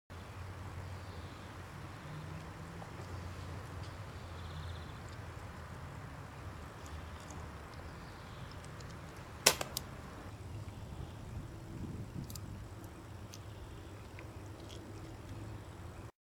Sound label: spitting, girls